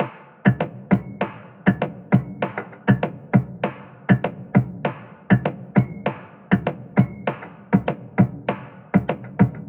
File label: Percussion Loop - 99 BPM, Hip Hop; Cuddle